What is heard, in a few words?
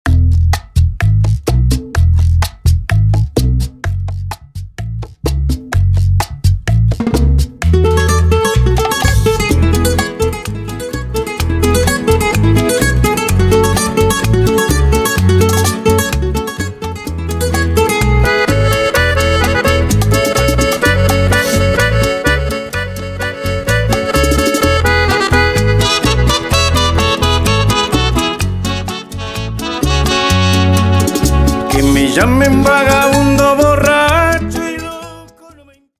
126 BPM Intro